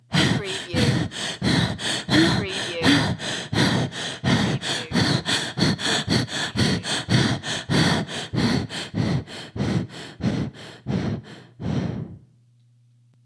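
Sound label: Female Panic Breathing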